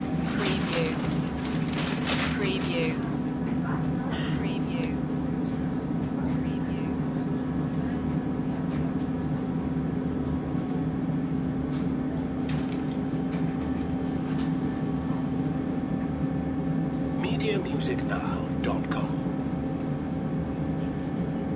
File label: train ambience germany